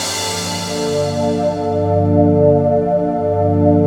FLUTE R; Sound Gallery - Stack Ware

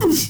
MALE WAR, Heart of Africa, shout

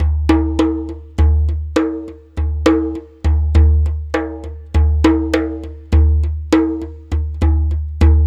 Dumbek Odd Pulse, Ethnic Percussion; Sonic Foundry (Sony Creative Software); Ambient Atmospheres & Rhythms

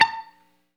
GTR Mute; 90's Sample CDs; Club-50 - Foundations Roland; Funk & Mute; Chunk Mute

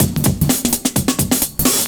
FILLS, 70_s Breakbeats

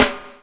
BUBBLE; Spectrasonics - Backbeat

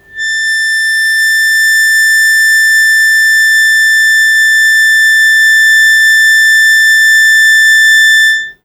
Long Sustain Aa A3, Harmonica; Zero G - Harmonica

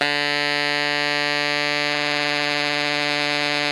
TENOR D 3, Saxophones; Greytsounds; Philip Wolfe David Crozier's Saxophones

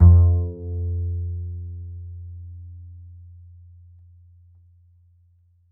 D string upright bass; Upright Acoustic Bass

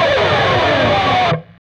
PRS SCRAP, Guitars; PRS SCRAPES

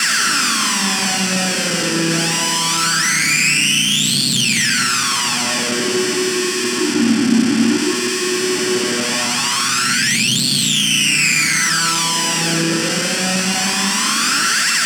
scrape Moog; Spectrasonic Distorted Reality 1

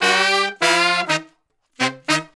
Horn Section; Sonic Foundry (Sony Creative Software); Jazz Solos and Sections

